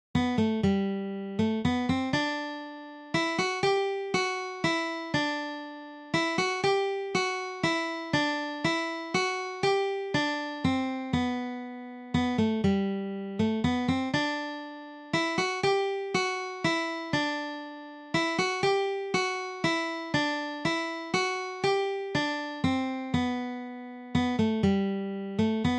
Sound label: the first noel guitar